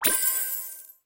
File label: get star prop